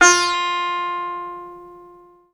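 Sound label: ethnic G, TABLA+SITAR; Best Service ProSamples vol.55 - Retro Sampler; AKAI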